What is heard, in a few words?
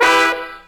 Horns